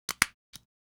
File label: stapler